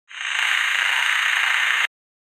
a game sfx

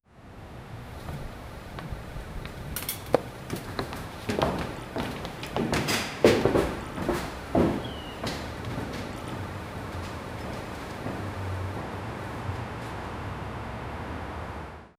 man opens a door and

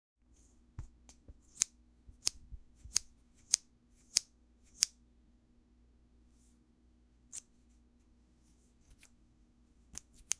cutting hair with scissor

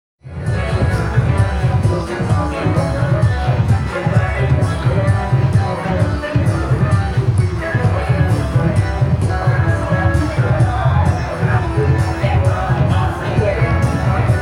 a location recording of a

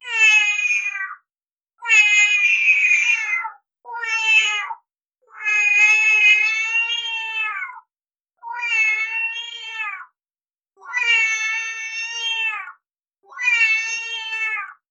sci fi cat meow

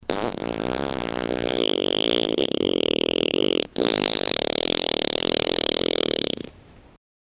a silly fart sound in a humorous cartoon scene

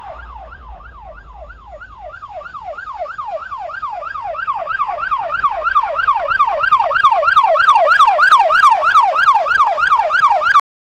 police car siren blaring in a chase

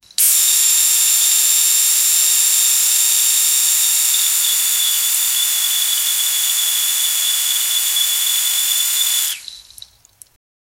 a fire hose spraying water with high pressure

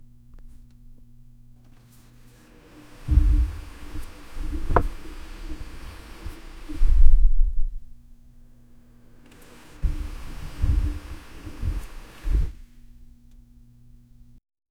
air seat moving up and

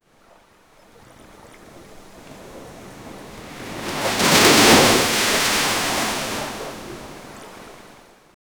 waves crashing against a rocky shore

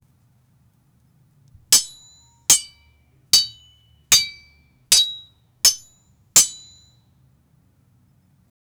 sword clashing against a shield in battle

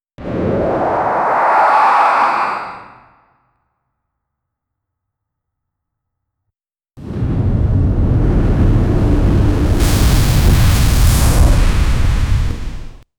monster attack tower defe

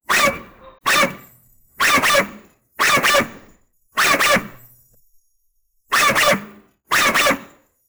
a robotic dogs bark with

